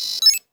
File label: success Tone